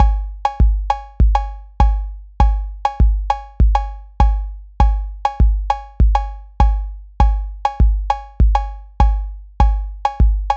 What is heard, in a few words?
double tresillo